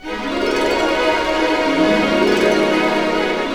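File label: 128, orchestra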